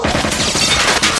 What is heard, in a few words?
automatic fire